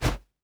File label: Swing Punch; Afterbern Democralypse